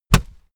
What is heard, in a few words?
punch